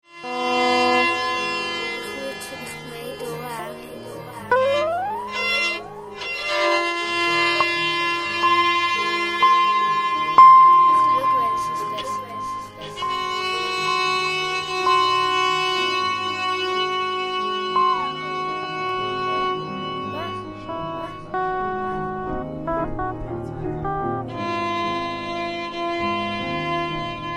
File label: guitar